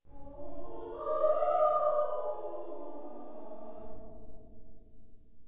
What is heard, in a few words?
Singing Ghost